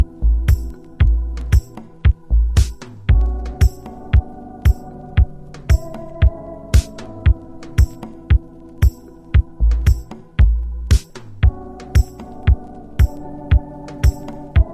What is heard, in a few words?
DOWNBEAT